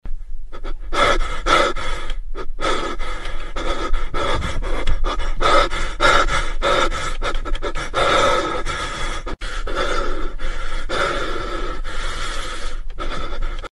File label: Anxious Heavy Breathing